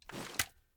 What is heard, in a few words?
raise mvmnt; gmod